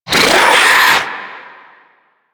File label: Swarm Vocal; Warframe; Man In The Wall; Limb Vocals